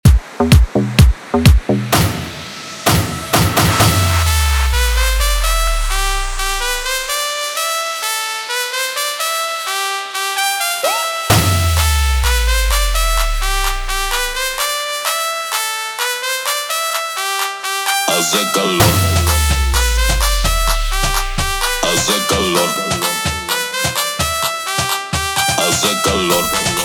remix aleteo 128bpm

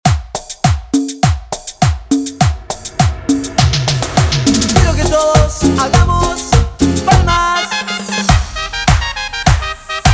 intro outro 102bpm

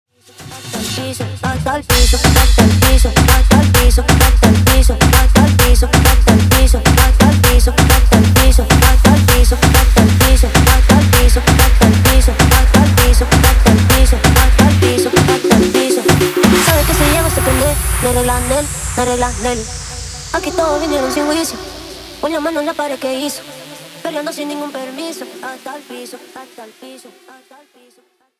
intro outro 130 bpm

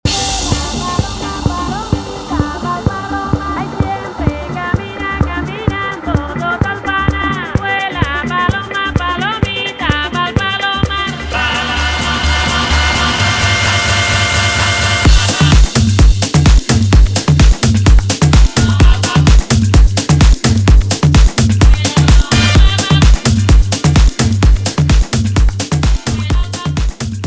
tech remix 128 bpm

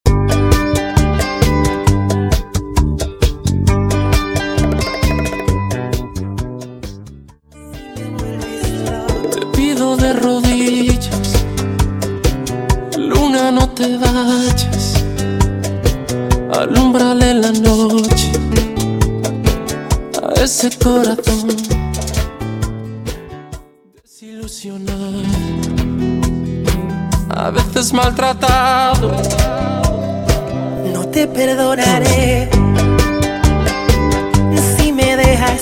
Intro Acapella Outro 133 BPM

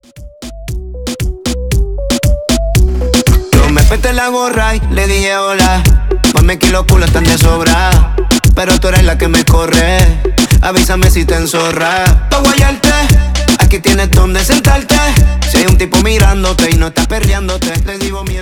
Intro Verso 116Bpm

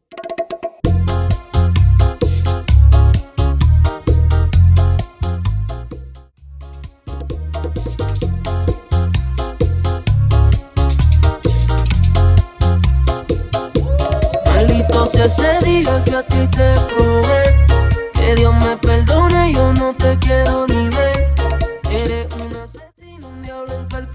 Transition Segway 130 BPM

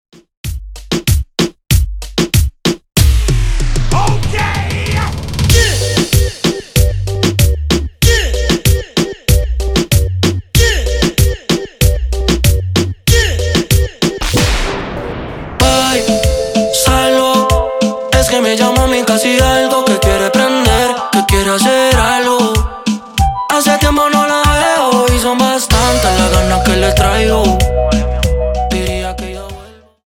Remix Intro 95 Bpm; Prodeejay Remix

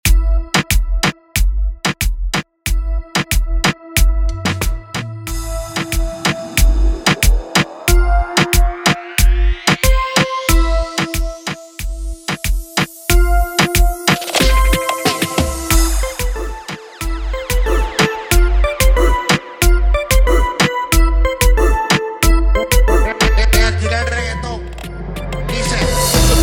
Intro Break Outro 92 BPM

